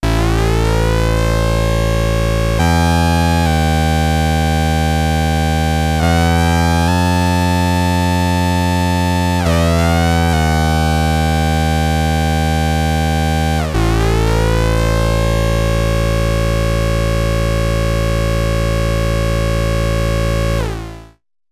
Behringer K-2 MK-2 16 square 4 saw OSC FM sync ENV